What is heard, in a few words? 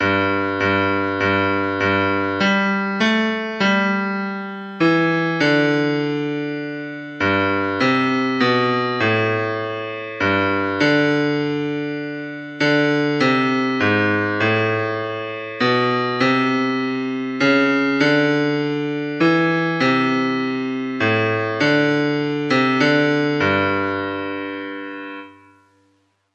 Bass